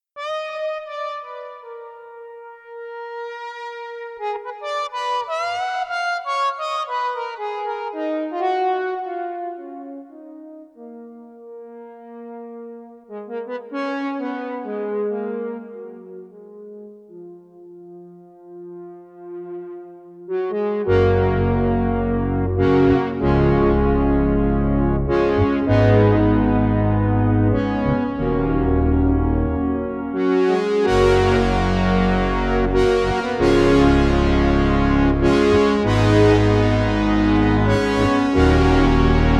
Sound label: Expressive Solo, synthesizer